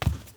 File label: footstep